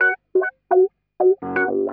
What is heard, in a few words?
RHODES loop 125; Houseworx